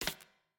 Step Wood Noise; Game rip; MiSide

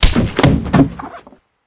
Hit Player; MiSide

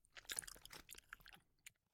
Player Eat; Game rip; MiSide

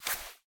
Cloth Take; MiSide

